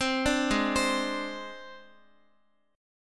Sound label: Ab Major Flat 5th Ab down Guitar; AbMb5 arpeggiated; JGuitar